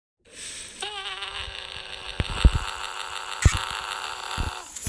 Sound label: screaming